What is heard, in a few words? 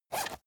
zipper use short quick